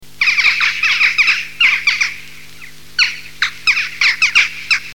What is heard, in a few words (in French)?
choucas